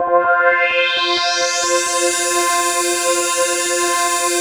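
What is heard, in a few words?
JP8 filter sweep, Jupiter 8; Big Fish Audio - First Call Vintage Keyboards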